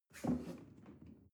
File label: chair wood take soft